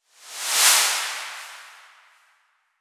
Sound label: Noise Sequenz